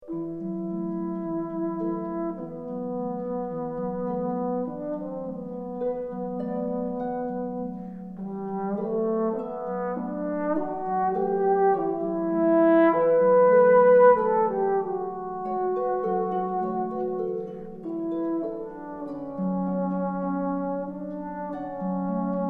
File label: harp